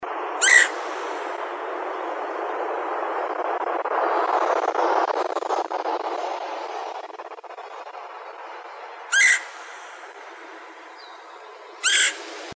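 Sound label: Red-headed Woodpecker call; Woodpeckers; RHWO; Coons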